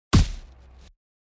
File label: unleash arrow